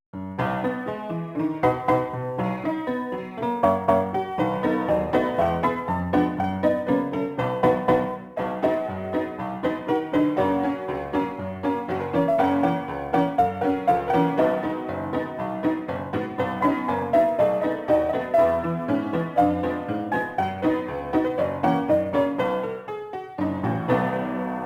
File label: Salloon; Nevada